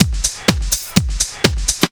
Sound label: drm 125, drumloops; PS-28 Vocal House Samples; realworx